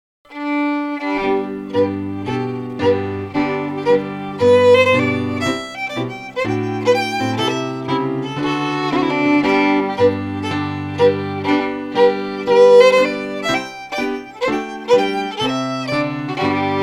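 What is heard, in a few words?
Strathspey, Scottish Fiddling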